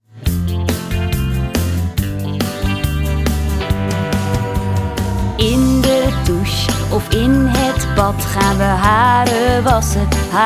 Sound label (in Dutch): Haren wassen lied; zorg voor jezelf; leerjaar-2; taalsprong